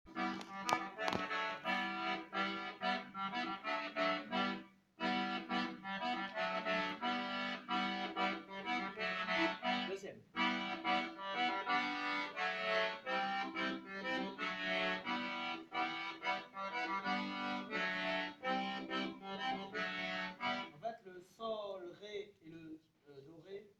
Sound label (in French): accompagnement ronde; Musique d-ensemble